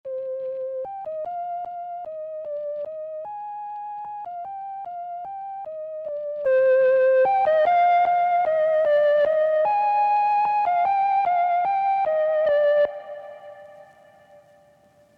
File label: retro color; rc 20; Plugins für Beats, Workflow & Feinschliff